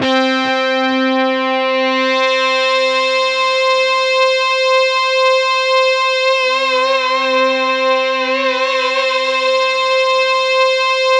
C3, Synth Line; NiceBeats

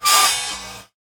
Cymbal; musicradar; cartoon caper

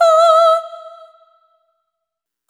Stigmata, vocal samples; Sonic Foundry (Sony Creative Software) - Pocket Diva World Class Vocal Samples; Op-Erratic Loops

